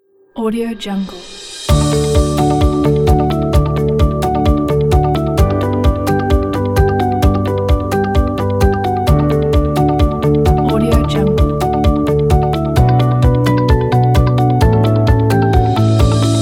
corporate positive

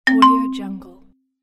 new sms text message

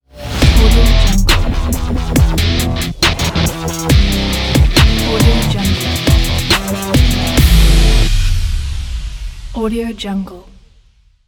trap metal intro logo

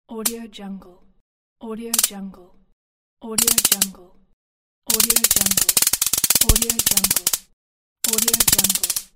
shocker sounds pack

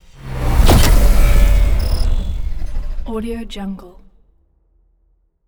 attack defense raven tech charge impulse; robotic lifeforms 2

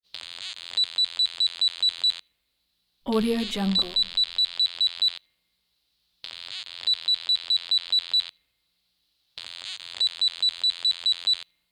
burst of beeps buzzy squiggle

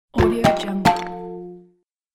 trash interface ready begin

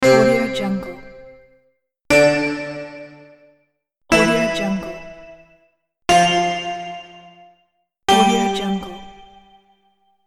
slot reel stop

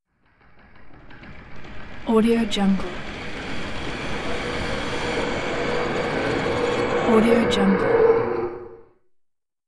spooky roller blind; marburymedia

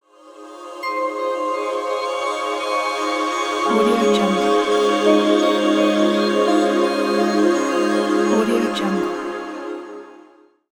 meditation logo

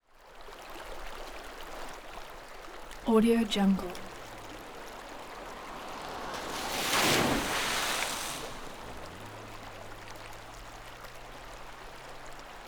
vehicle pass by through water

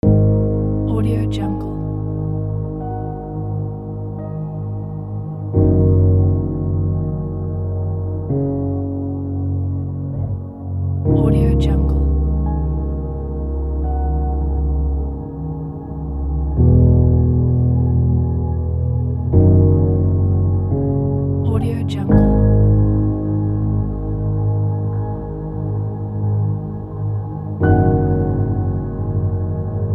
intro credits ambient

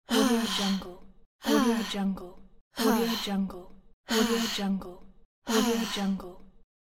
girl tired

